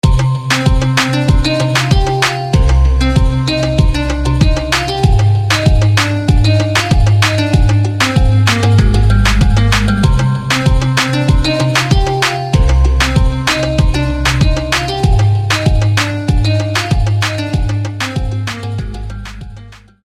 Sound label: Echo Uke